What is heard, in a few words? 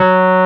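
WURLY NO, Wurlitzer electric piano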